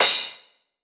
Cymbal Acoustic, 909; 909 Cymbals; Slate; Vintage Drum Machines Volume 3 909 Edition

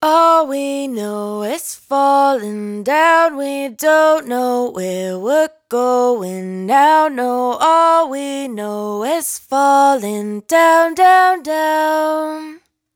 Dry Vocals hook All We Know 126 C#m; Raw Vocals; Slate Digital Omnivox Vocal Sample Pack

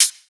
hat cream, Closed Hat; Drum One Shots; SD MIDNIGHT Sample Pack; Slate

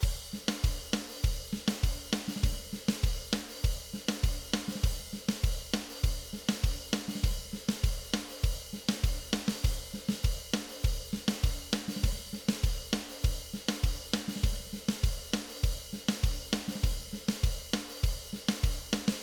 Cumbia Verse

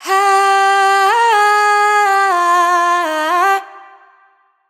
riff Ah Huh Run Cm wet, vocal; Slate Digital Omnivox Vocal Sample Pack

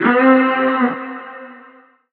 vocal chill C; Slate; AURORA Sample Pack; Vocals

